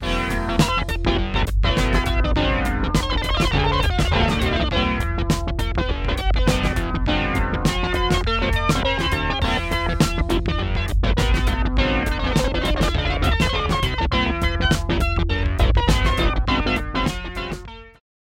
Oscillator KEY Acid Funk; Alphatron; Syntheway

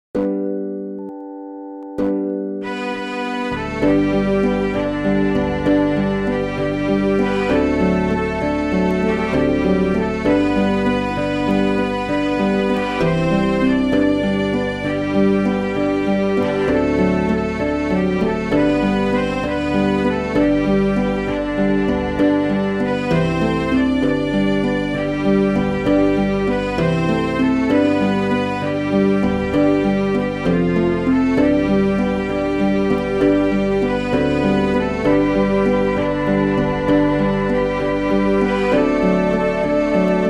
Strings Flute Harp; Syntheway Strings; Chordophonet

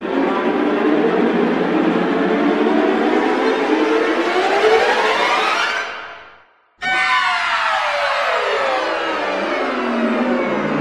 Strings Sautille Effect; Synthphonica; Syntheway

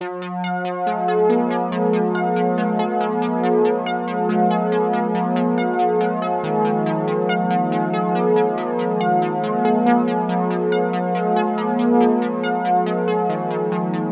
Oscillator KEY; Alphatron; Fantasyland; Syntheway

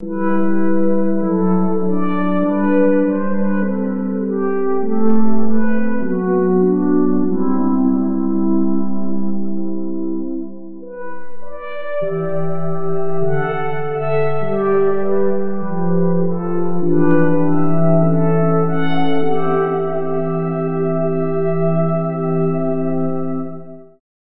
PAD; SpheratorFM; Heavenly Touch

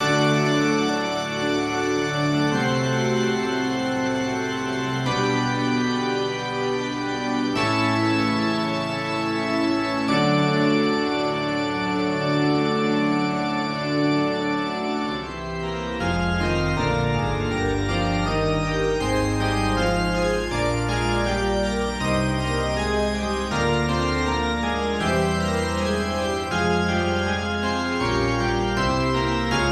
Cathedral Organ; Organux; Syntheway

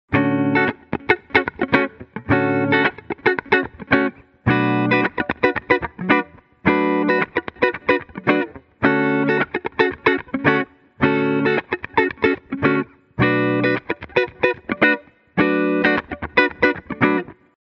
Phaser, Spinning Speaker, Guitar Dry; Chromacycle; Syntheway

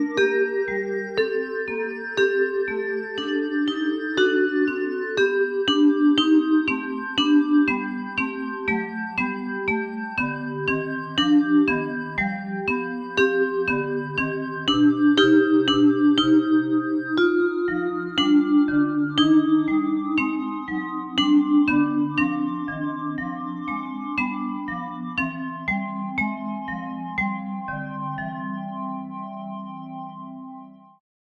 Vibraphorgan Organ Vibes; Organux; Syntheway

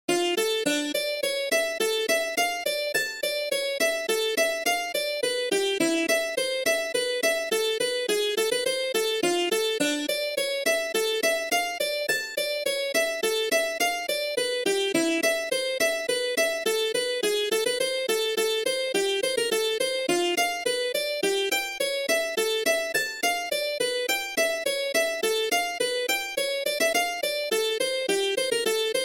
Hammered Dulcimer; Chordophonet; Syntheway

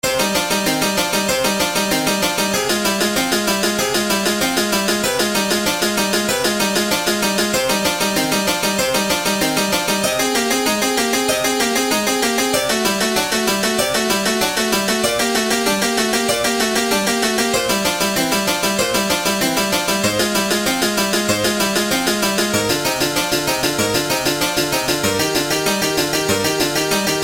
Harpsichord Coupled; RetroMagix; Syntheway

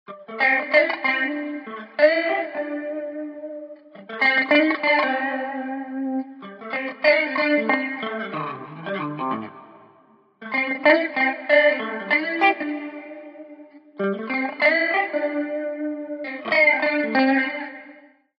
Watery Chorus Guitar Wet; Vox Quantizer; syntheway

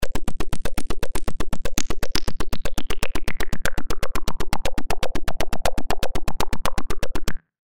Liquid Percussion; Stepattern; syntheway

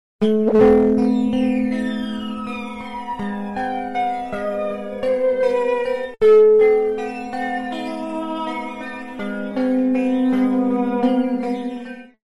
Stereo Flanger, Tube Saw Flanger, Guitar, Wet; Sonicircuit; Syntheway

